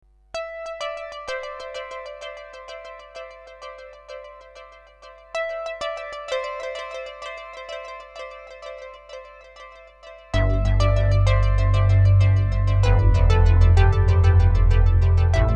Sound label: Alesis A6 Doing Moog XL like sound